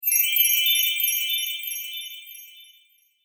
Fairy Magic Sparkle; Magic Sound Effects

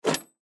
Pinball Coin, Pinball Coin Compartment Close Door; Arcades; Sports; Blastwave

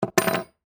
Skeleton Key Down, Set; Foley; Jangling

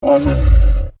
Dinosaur Groan, Creature; Animal; Dinosaurs; Animals; BlastWave

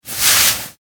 Sports Snowboard Pass By; Skiing and Snowboarding

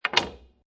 Billiard Pool Snooker Put Cue On Table; Pool (Sound Effects:Sports)

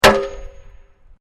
METAL STRIKE; Materials

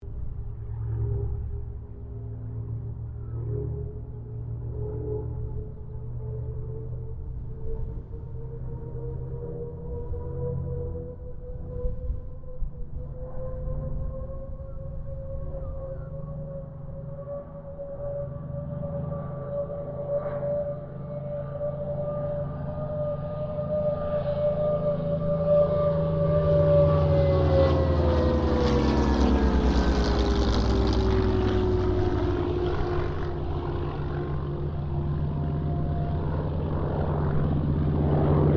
Kittyhawk Boomerang pass, Airplane; Mixed aircraft squadrons

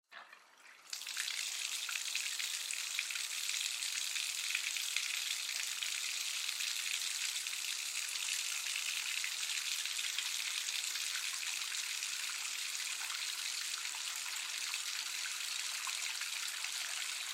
Household Bathtub Fill Up Water; Bathroom; Blastwave

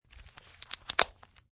Buttoning Metal

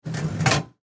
Fountain Drink, Soda, Machine, Dispense, Short; Soda Guns; BlastWave

